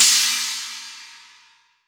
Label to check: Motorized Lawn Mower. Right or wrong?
Wrong